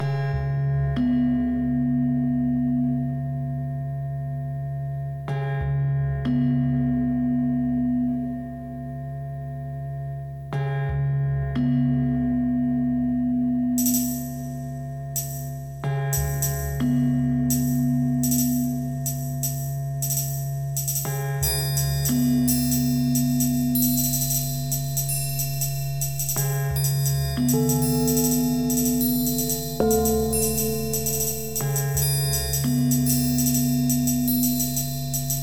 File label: Gamelan Dzwonki; grom; terror in tibet